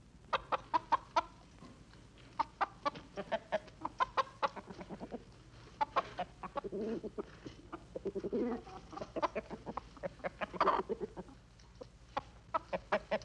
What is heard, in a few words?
chickens